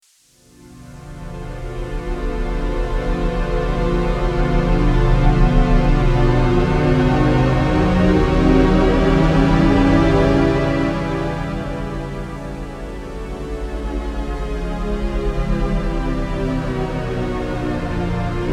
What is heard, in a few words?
JD-800 Model Expansion